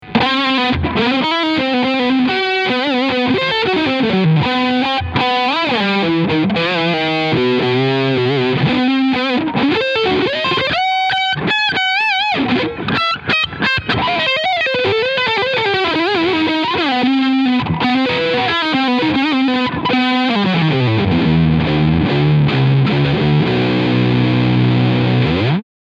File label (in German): Leadsound Steg HB